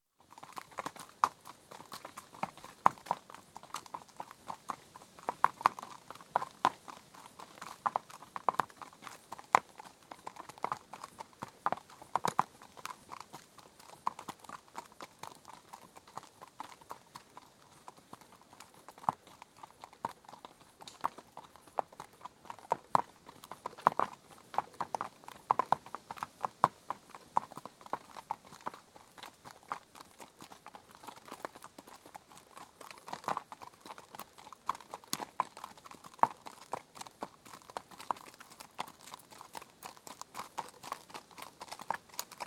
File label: Two horses out on ride, recorded from rider's perspective; Horse Sound Effects; BBC